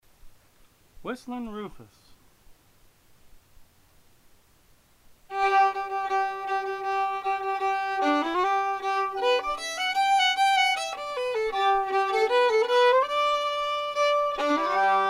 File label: Whistling Rufus, fiddle